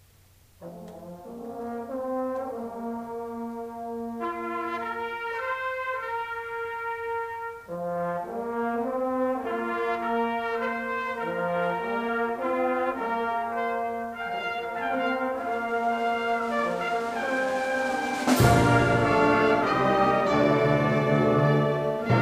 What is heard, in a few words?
Flourish for Wind Band, Wind Ensemble; FCC; Roosevelt High School Wind Ensemble